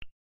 Button

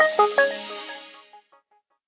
Shutdown; LG; LN272-Rumor-Reflex

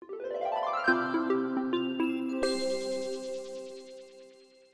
Power on cheerful; Samsung SGH-E500

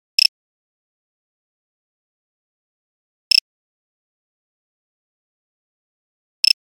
3410, Nokia Buzzer; Mangrove; Windows Phone apps

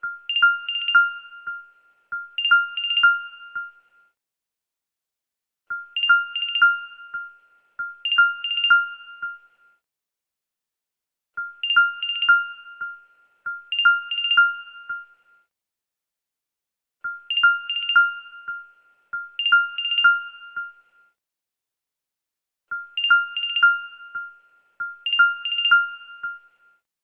Starlit, ringtones; L Bello; LG

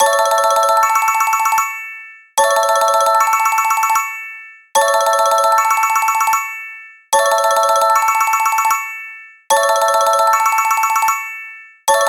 Xylophone, ringtones; Lucid 4G VS840; LG

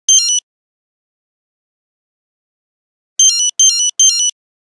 8310 Hurry up, Nokia Buzzer; Windows Phone apps

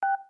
DialPad, Beep; GS390 Prime; LG

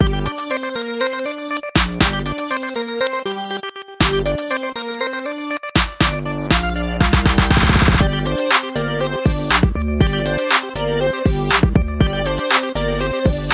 Online Pop, ringtones; MyPhone my96 DTV

